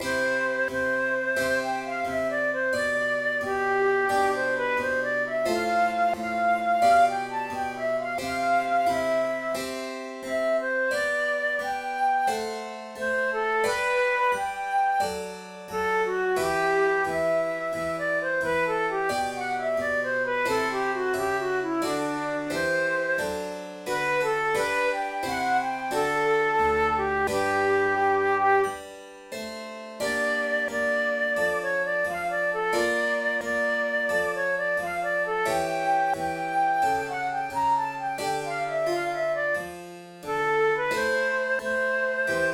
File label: telemann oboe sonata in a minor andante